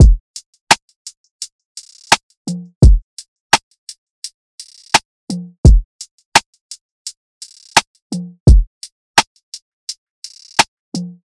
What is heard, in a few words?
Quilt Drum Loop - 85 BPM; Cymatics; Vibes II Drum Loop Collection